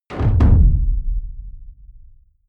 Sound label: heavy sub noise hood